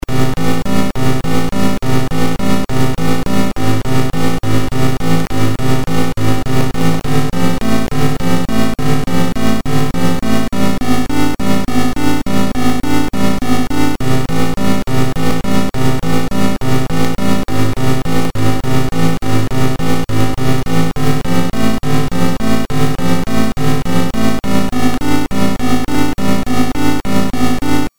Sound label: arp prog